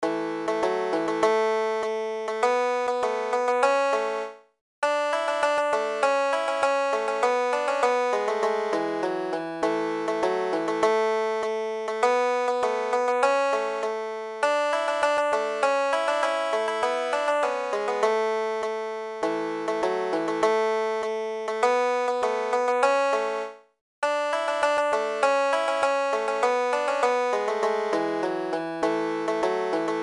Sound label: Banjo